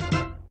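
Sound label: fanfare end of ball, pinball; WILLIAMS; INDIANA JONES; Indiana Jones Original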